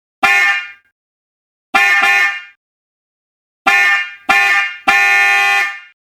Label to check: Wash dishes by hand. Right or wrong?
Wrong